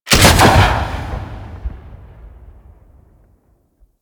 Rolling Drone Turret Radial Fire

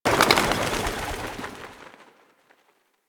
Birds Take Flight; Warframe; Ambience; Nineteen Ninety Nine

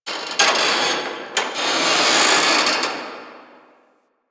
Metal Creak; Ambience; One Offs; Warframe; Quests; The New War; Drifter Camp

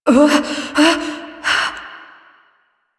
Fight Breath; Cinematics; Warframe; The New War; Throne Room

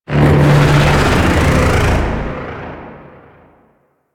Void Dragon Vocal Ability; Enemies; Vocals; Warframe; Duviri

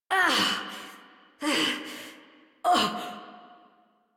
Struggle Long; New War; Adult Operator

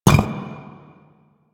Footstep; Warframe; Warframes; Qorvex

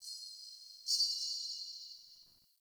distant chains; Ambience; Dungeons; Alley; Under City

